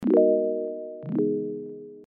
Clavichords; Piano & Keys; Crowdsourced Sample Pack

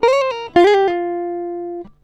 guitar; TRUTH-HZ; Crowdsourced Sample Pack

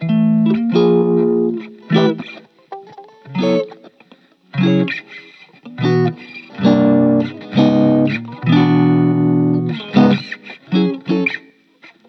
Guitar Chords; Crowdsourced Sample Pack; TRUTH-HZ

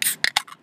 soda can sample, Foley; TRUTH-HZ; Crowdsourced Sample Pack

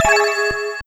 piwuuu; Truth-Hz - 1-Hit KO Sample Pack

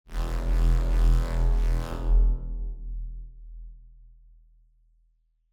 BASS LINE; Crowdsourced Sample Pack

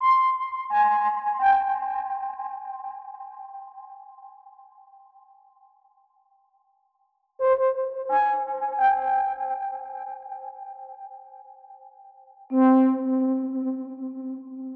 intro, Melody Loops; TRUTH-HZ; Crowdsourced Sample Pack

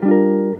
Guitar Chop; Crowdsourced Sample Pack; TRUTH-HZ

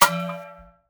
hyrdi flask, Percussion; Crowdsourced Sample Pack; TRUTH-HZ; Drum Kit

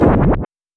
bwowowiapiop; Truth-Hz - 1-Hit KO Sample Pack

